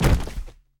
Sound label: ground bounce; bloom minigame